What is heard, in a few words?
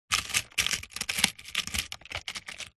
industrial screws nails in plastic tool box tray rummage